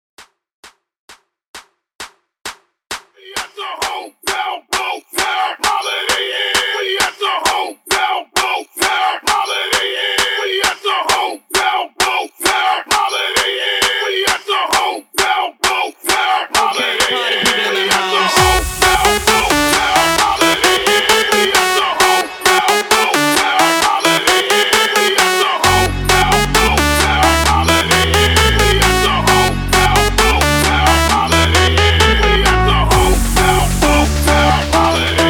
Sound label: PARTY STARTER, 132 BPM